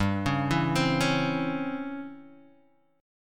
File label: Db Minor Major 7th Flat 5th G